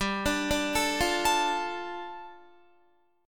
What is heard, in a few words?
G 7th Suspended 2nd, Guitar; G7sus2 arpeggiated